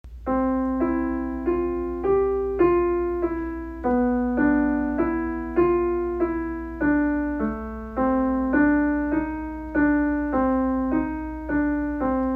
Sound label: Cool Breeze Waltz, Home Recorded, student recordings